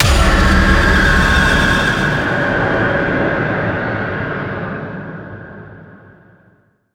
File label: archeology event screams; ancient relics